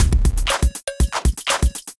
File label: Kindified Kit, Drums; Kindified Kits